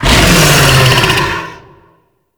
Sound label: Infested Bone Blade Vocal F; Warframe